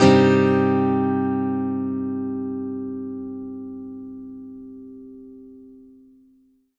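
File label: acoustic guitar C minor up, right; Country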